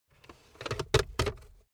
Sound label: car backseat table pull up unsteady